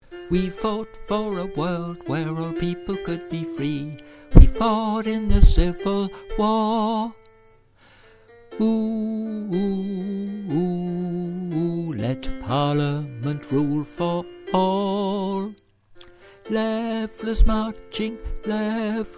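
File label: Soprano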